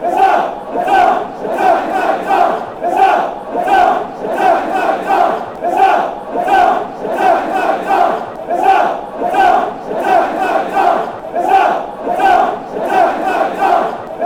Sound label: attack sunderland, chants